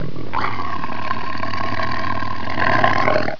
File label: headcrab die; svencoop